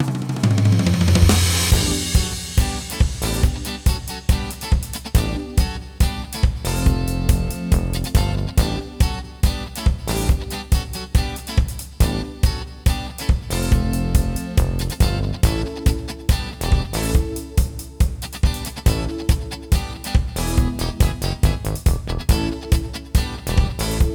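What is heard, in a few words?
BGM Main Jump; Super Mario Party Jamboree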